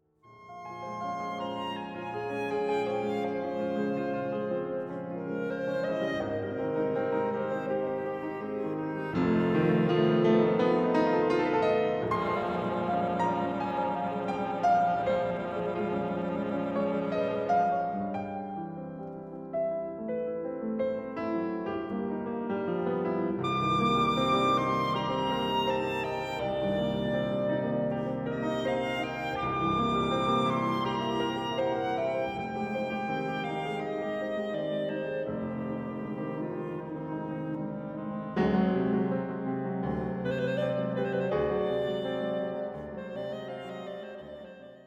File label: piano wind; poulenc